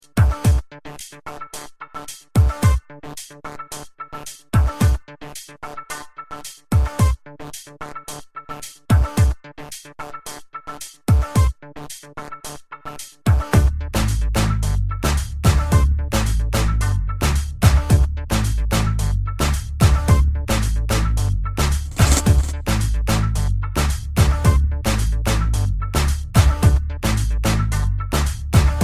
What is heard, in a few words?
Music